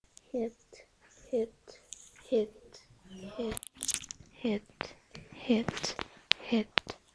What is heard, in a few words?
hit